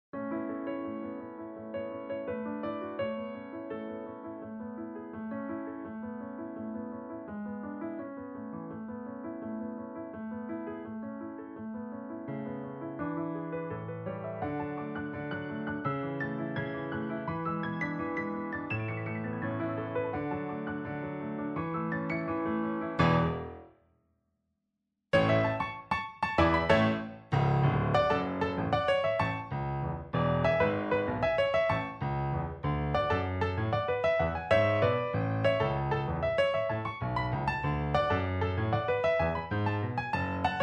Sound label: song piano; Little Mermaid; Sebastian; Accompaniment; Disney Cruise Line